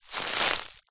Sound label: footstep single grass thick dry; foley; zapsplat